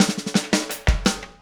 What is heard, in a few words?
Static Beat Intro; Sonic Foundry (Sony Creative Software); Indie Rock Anthems; Session 13 - Static